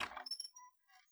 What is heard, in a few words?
shutter wood open start; Rust